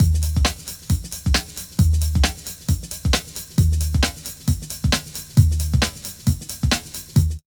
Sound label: LOOP, Tekno Industrial; East West